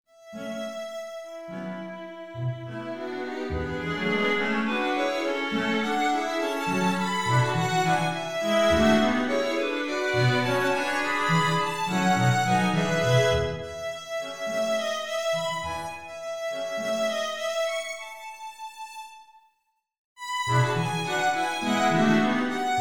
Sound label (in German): streichquintett